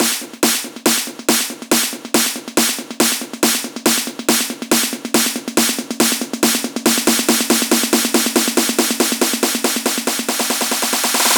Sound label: 140BPM SNARE 8Bars; Vengeance Samples; Snares&FX Pairs